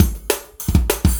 Warp Drive Beat, Processed Drumkits

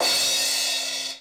SEQUENTIAL CRASH; Hollywood Edge; The Big Bad Beatmachine

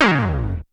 WIND DOWN, Techno Trance; E-MU Formula 4000 Series Vol. 2

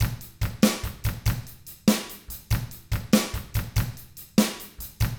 ROCK 6/8, Drums; ILIO; Double Platinum Drums 1

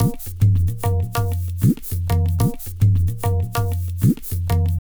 Tabla, 100 BPM; Pure Tabla; Zero-G

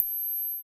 HH, HH Synth; Sound & Vision - Gigapack II; HiHat Synth

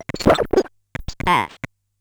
Bent Talking Teacher, Circuit Bent

